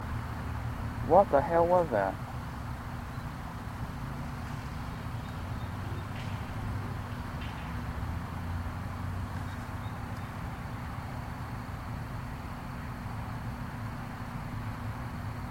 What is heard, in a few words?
whipping sounds